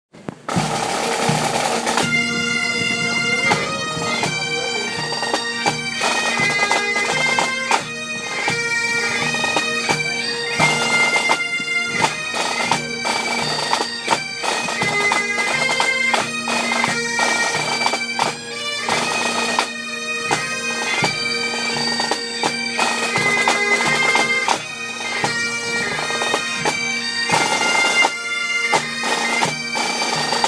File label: bagpipers in farnham